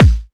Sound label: kick layer; kicks